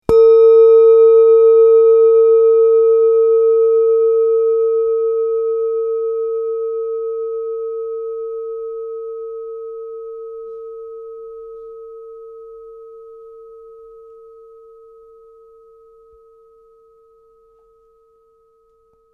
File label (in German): klangschale venus 323g